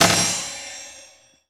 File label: SHOENHUT, PERCUSSIVE